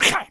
snowman hit; Eft

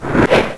TREEMON Attack; Eft

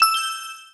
menu click

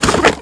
Chicken Hit; Eft

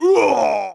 Soldier Die, game; Eft

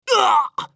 Ninja Man hit; Eft

